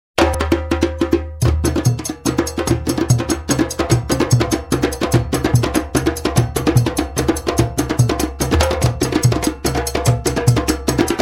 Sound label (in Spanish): Musica Africana Percusion etnica drums